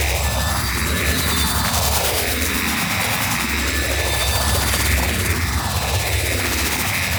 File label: Power Rift Loop; Gameplay; Warframe; Zariman